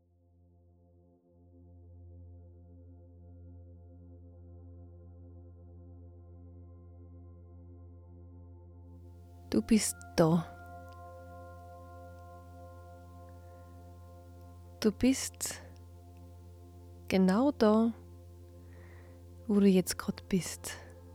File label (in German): Meditation Innere Friede mit Musik